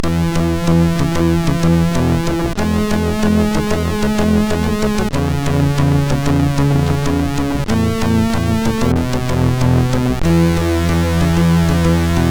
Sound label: Intro Tune Two, MOS6581R2